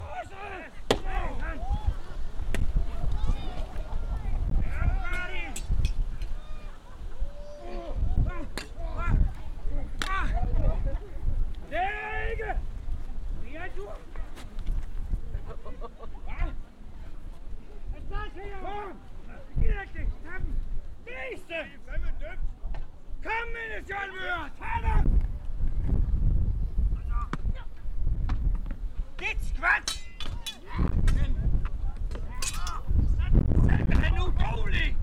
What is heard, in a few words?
fighting